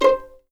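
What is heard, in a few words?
C5 pizzicato MF, The Ultimate String Collection; East West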